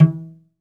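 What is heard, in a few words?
SOLO CELLO, Synclavier Strings; ILIO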